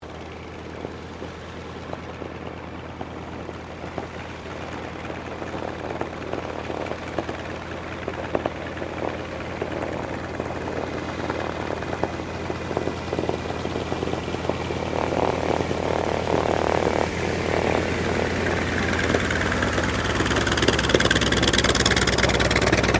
AIRCRAFT T-28D TROJAN TAXI SHUTDOWN; Airplanes; Vehicles

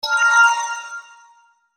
Sweeper Marimba Shimmer Flange; Mallet; Production Element Imaging Element Accent Transition; Mallet Sound Effects

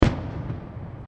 Fireworks Single Explosion; Backgrounds; Crafted Signals

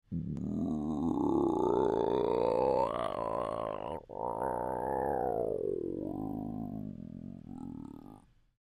Creature Groan Throat Rumble, Human; Groaning; Voice; Groaning Sound Effects; BigRoomSound

